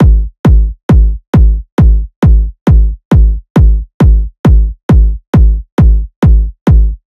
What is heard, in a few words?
Kick Loop 135, Techno Drums; Ghosthack; Techno Sounds 2025